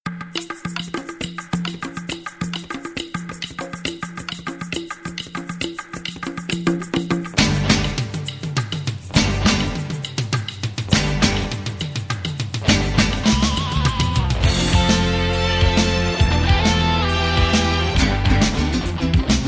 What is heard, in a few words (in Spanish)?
Pista Tambor Curiepe